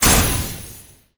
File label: reflection aura